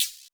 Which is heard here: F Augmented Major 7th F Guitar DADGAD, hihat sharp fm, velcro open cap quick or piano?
hihat sharp fm